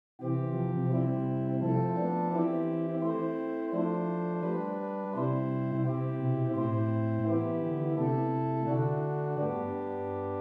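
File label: Swell Geigen 8, organ stops; St Annes Moseley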